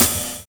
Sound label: SUSPENS; Univers Sons